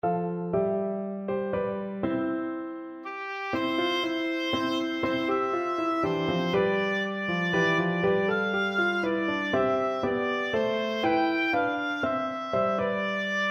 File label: cockles, oboe